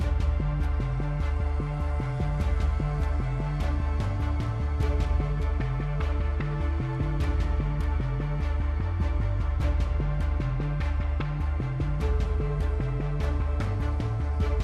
Waiting To Drop; apex legends season 12; Newcastle

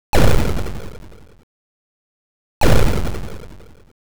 Enemy Plane Explosion; Oretachi Game Center Zoku; Time Pilot

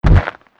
walk dirt

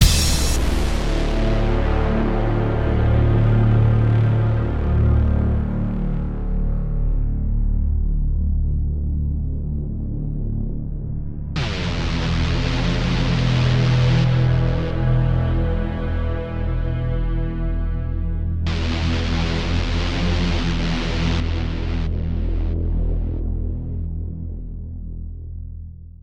Destroy; Stormrise

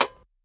Snd phone up; Knuckle Sandwich demo 2023

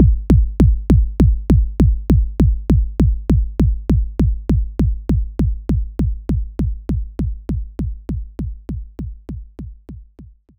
Electronic Kick; knights try

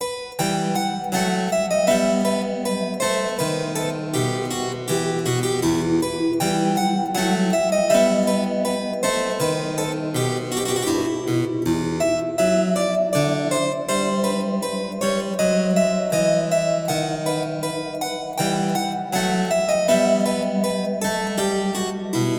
Music Bourree

